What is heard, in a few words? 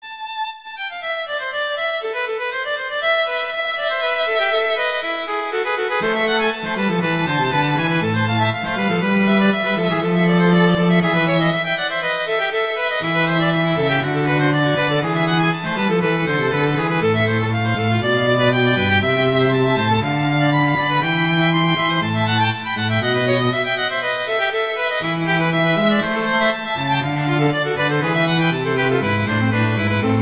Allegro; Corelli